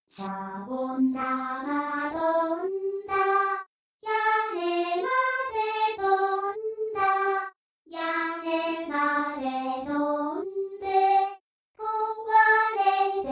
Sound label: choir